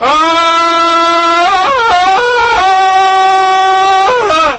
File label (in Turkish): aaaa tarzan; kemal sunal